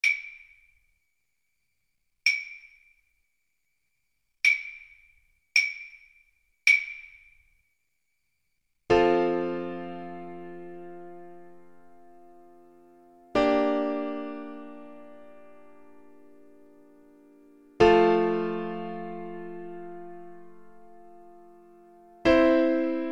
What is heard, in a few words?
Trombone